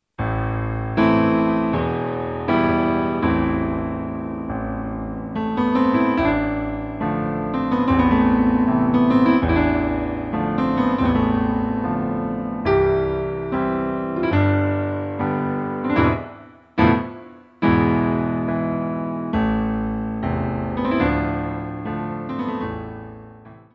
battement

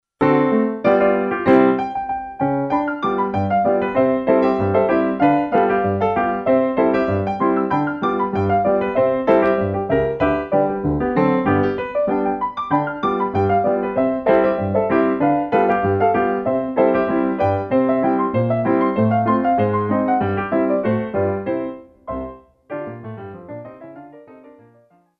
ragtime

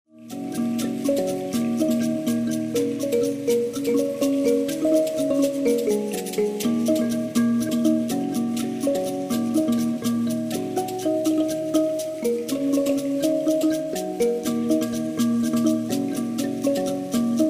mbira; wade in the water

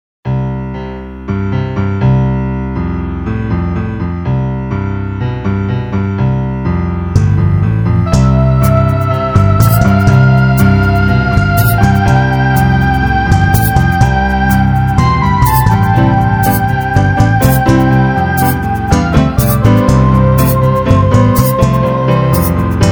piano

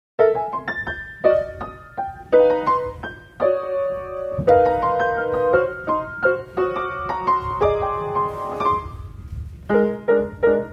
piano

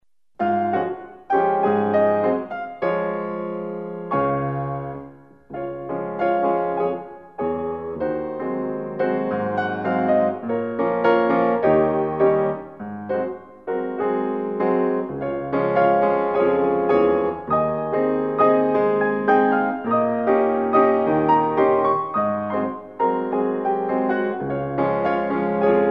tendu tango

